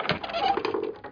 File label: wooden chest open; Daikatana; items